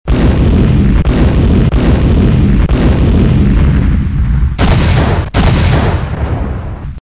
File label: big aaa, Rockets Weapons Explosions